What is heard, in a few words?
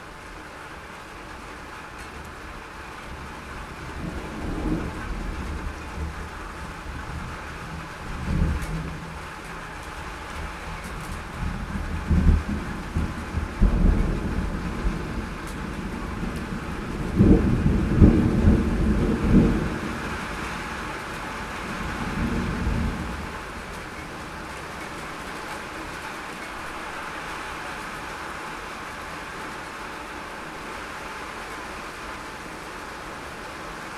field thunder spring, Beautiful rainy spring night, enchanting us by some great thunders and rumbles.; soundscapes